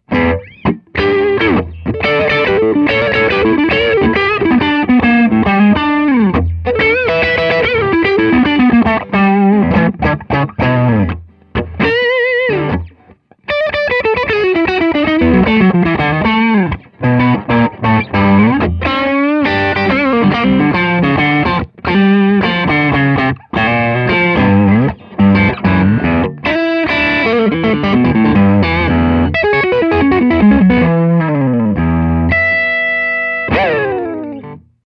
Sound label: Hollow T neck, pickups